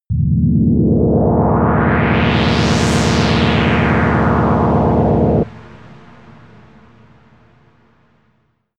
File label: dangerous universe pad; sweeps-swells